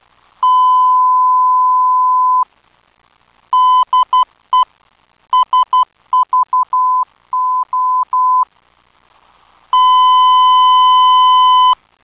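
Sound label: SVO 12603.5kHz, non-directional beacon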